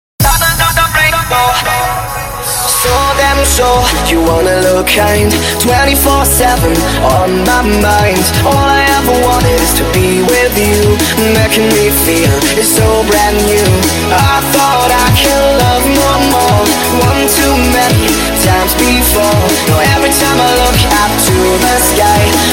Hardstyle Remix; Tamers Music

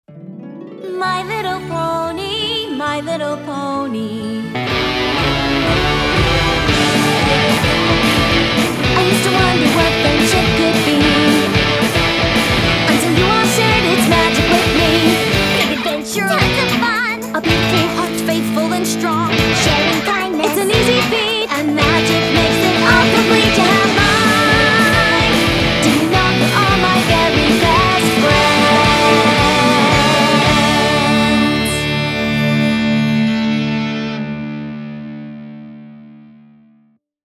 (Guitar Cover)

